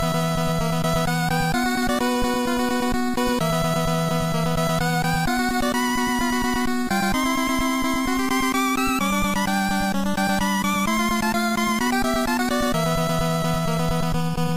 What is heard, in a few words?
8 Bit; Gunsmoke